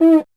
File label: Cuica Open, Standard Kit, Yamaha PSR-E323